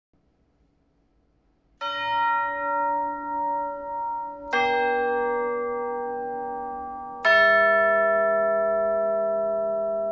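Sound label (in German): Röhrenglockenspiel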